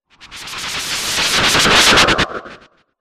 motion; animal

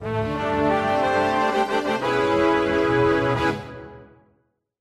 appearance, jingle

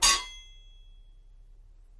metal hit

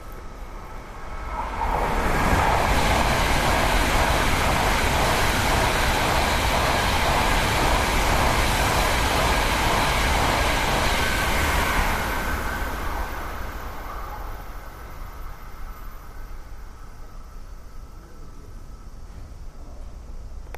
train passes

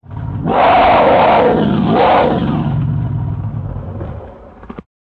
roar of beast; animal

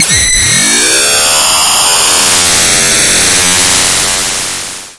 laser gun; operation

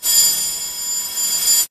alarm clock; operation

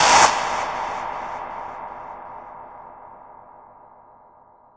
shock; animal

